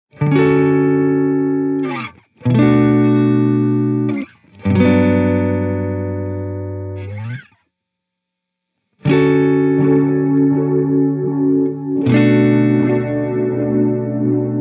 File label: Tape Space Echo